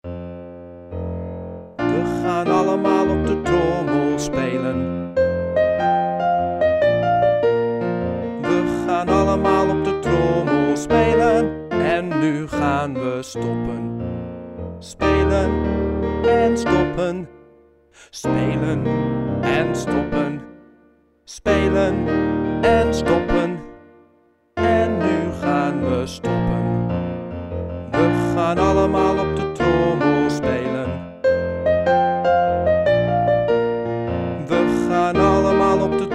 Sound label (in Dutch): We gaan allemaal op het drumstel spelen; music time; advancedbionics